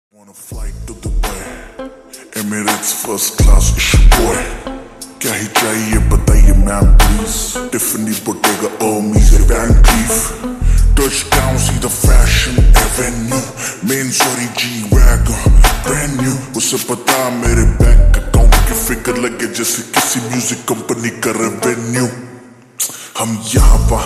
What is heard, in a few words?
Slowed Reverb Ringtone